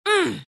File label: grunt; dota; legion commander